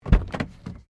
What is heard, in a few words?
CAR SUBARU LIBERTY INTERIOR DOOR OPEN; Car Subaru Liberty 20 01; Family members Subaru; Cars (Sound Effects:Vehicles)